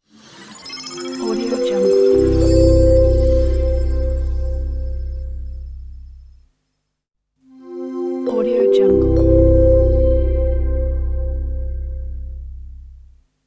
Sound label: futuristic elegant short logo